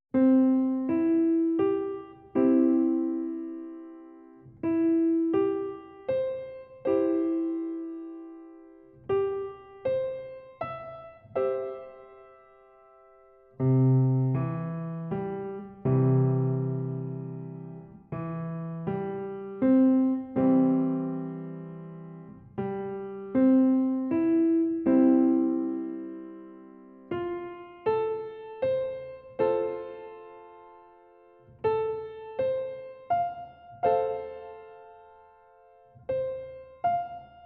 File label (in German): Hauptdreiklänge mit Umkehrungen in C Dur